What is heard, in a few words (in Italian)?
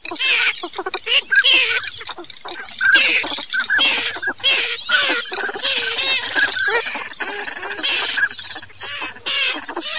CODONE MARZAIOLA